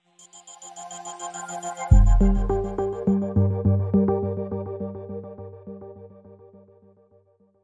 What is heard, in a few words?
Lounge Sting